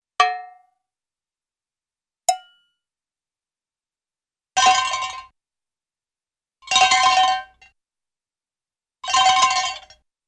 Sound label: Cowbell Donks, Cartoon; Hollywood Edge; Cartoon Trax